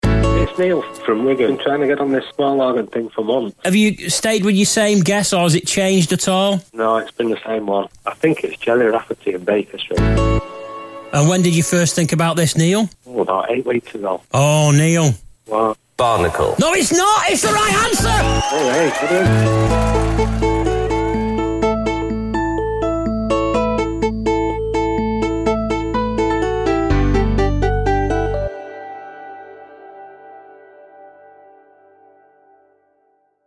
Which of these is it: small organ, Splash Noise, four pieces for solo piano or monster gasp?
small organ